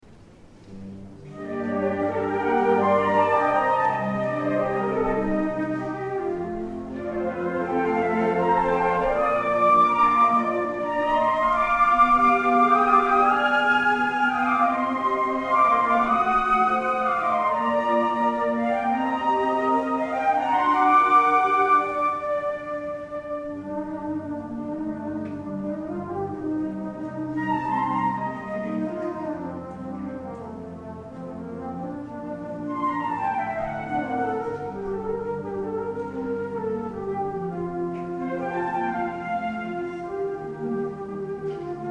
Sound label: consert, flute; arietta-flute